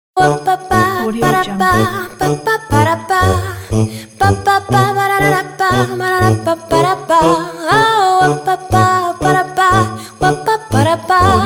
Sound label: quirky fun jingle bells